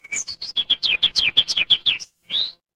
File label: animal bird